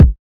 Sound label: subtle reverb kick single shot c key